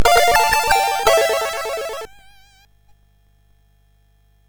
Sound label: Jingles, sid MOS6581R2